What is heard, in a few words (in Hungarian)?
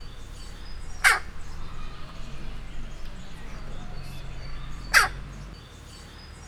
dupláz, csóka; állathangok; miskolci zoo 2018